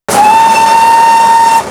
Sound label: whistle, castle class